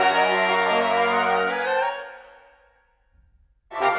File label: Brass Winds, Orchestral; Sonic Foundry (Sony Creative Software); Orchestral VOL-2; Modern 16